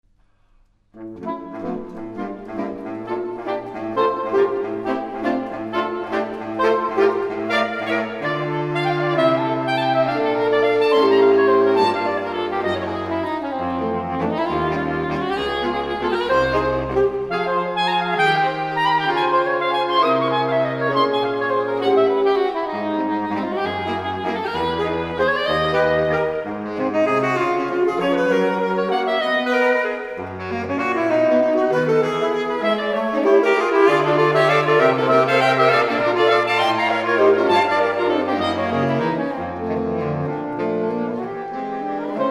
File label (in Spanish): Jazz Suite Waltz, saxofones